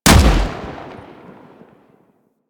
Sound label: Spark Rifle Shot; Grineer